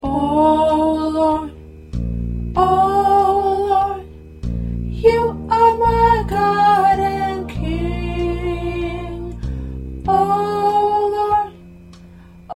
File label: P Soprano